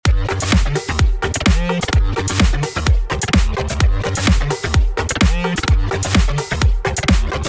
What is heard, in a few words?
env good edm